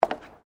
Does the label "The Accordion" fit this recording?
No